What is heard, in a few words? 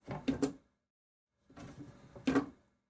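toilet seat sounds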